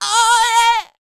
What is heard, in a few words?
D YEAH, Vocal R&B Dance; DB DANCE